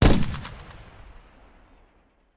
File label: Machine Gun; Sound Ideas; Guns